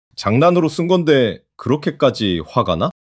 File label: surprise